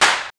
SD, Clap; Sound & Vision - Gigapack I; Snap

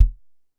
Drum X Kick, Pearl Drum-X

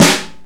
AMB SNARE; Greytsounds VOL-1; SDX SNARES

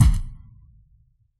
jungle kick; Dr 202; Percussion

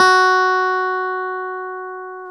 CLV CLAV DA; Roland LCDP10 Keys of the 60s and 70s 2

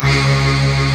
EMU II VOX, SYNTH VOX; East West - Global Sounds

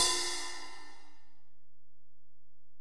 Ride, Alesis DM-PRO, Drum Machines

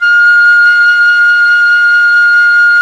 FLT PICOL, Flutes, Picolo& Flt; Club-50 - Foundations Roland

